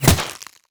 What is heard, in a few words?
bullet impact ice; Infernal Radiation